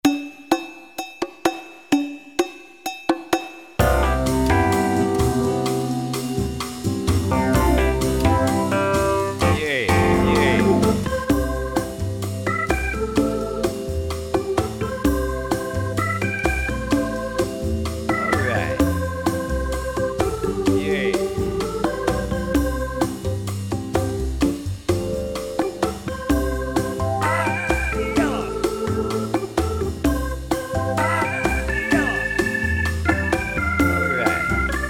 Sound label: Bongos